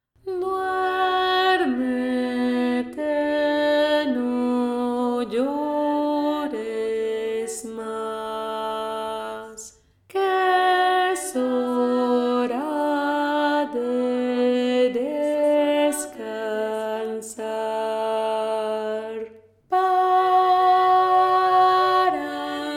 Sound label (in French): VOIX GRAVE; Duérmete niño indito – Trad. Guatemala; Une très belle berceuse guatemaltèque